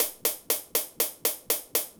drums

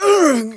pain

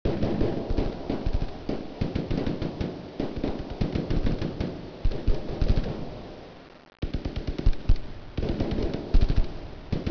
ambient guns

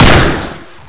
dmp7

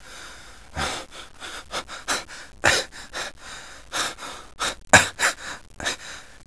breathe, player

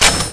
cart foley, weapons

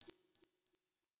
yoshi egg ping

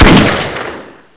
shoot; weapons; infinitysr

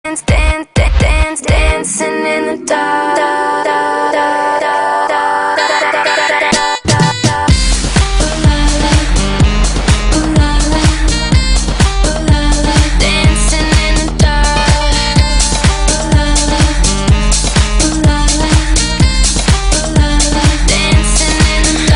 dark

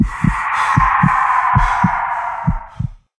Sleep Impact

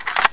scar clip out, weapons

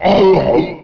death; biohazard

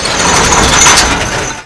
m134 spin down

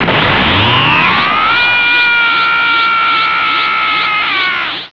powerup; shmod

